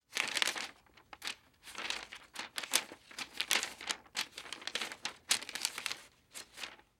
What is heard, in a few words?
Household Pages Turn Pages